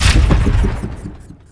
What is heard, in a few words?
chaingun off; weapons; Tribes2